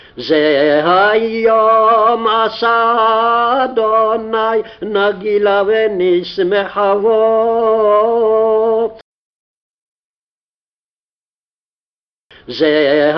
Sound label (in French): refrain chanté